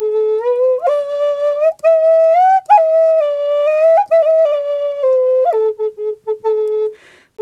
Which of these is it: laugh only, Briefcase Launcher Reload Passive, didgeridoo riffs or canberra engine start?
didgeridoo riffs